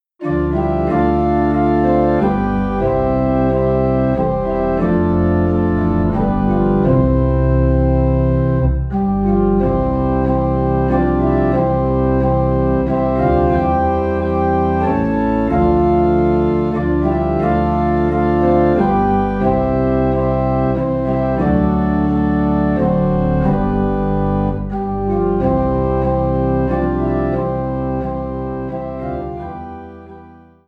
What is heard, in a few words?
Organ Low in C; christmas; Away in a Manger (Alternate)